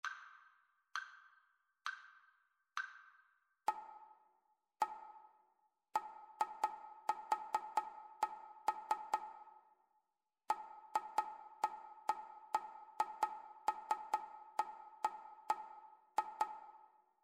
rhythmic dictation

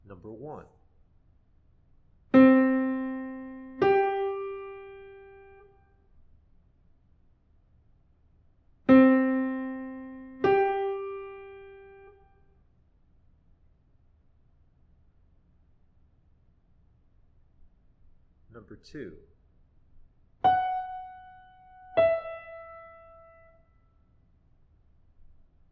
intervals

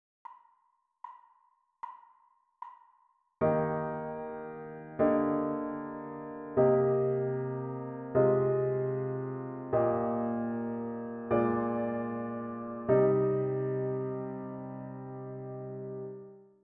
harmonic dictation

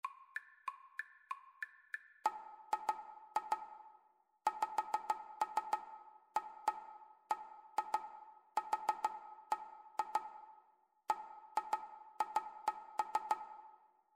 rhythmic dictation; ear training

